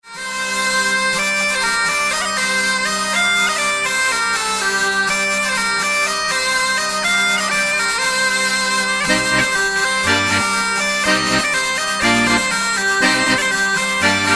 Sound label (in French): Bourree; Bourree du Val d'Amour